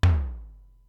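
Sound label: Simmons SDS-9 low tom, Drum Machines; Factory D3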